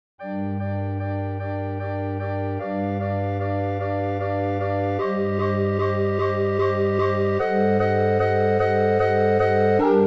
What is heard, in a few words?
Gloria FLAUTO cemb org 2 voci enbart ORGEL; Cembalino & Organetto part; harpsichord